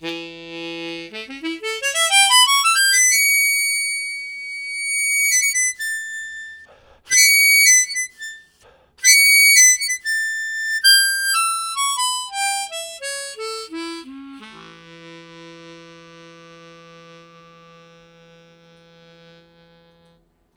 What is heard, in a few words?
Slow Gliss, Harmonica Riffs; Zero G